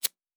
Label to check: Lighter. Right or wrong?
Right